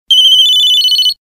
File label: Tone